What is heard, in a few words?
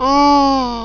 pain; HALFE LIFE 3